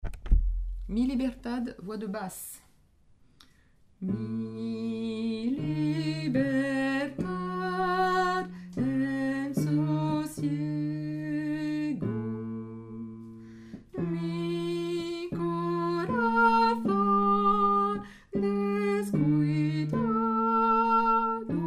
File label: mi libertad basse; repetition agen septembre 2024